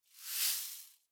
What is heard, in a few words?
Shaker (Slow)